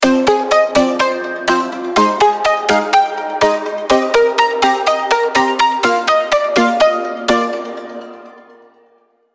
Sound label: Melody Plucks